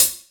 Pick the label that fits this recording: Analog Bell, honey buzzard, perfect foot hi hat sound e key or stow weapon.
perfect foot hi hat sound e key